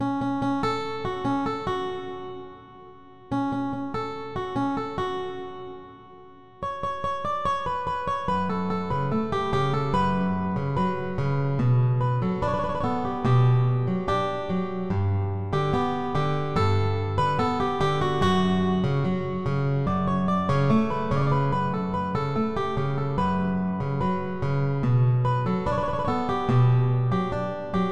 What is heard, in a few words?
guitar